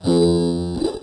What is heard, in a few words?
fx one shot uboot; Nik Dean